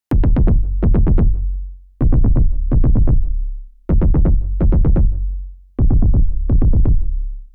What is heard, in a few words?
Bass Techno groove Mid; bass loop